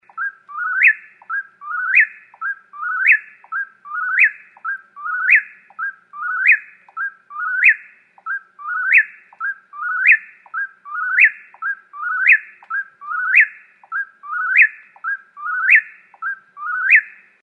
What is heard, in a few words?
Whip-poor-will